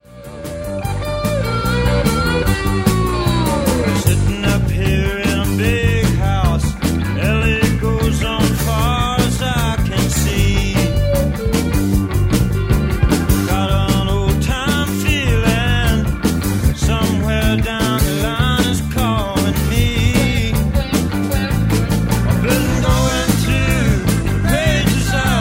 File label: California Blues